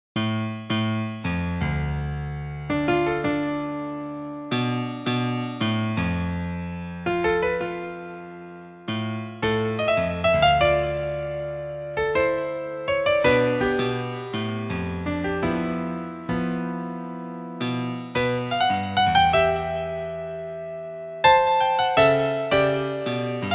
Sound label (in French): Répétition de piano; Majora's Mask